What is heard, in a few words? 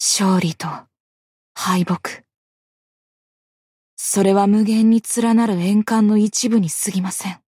fail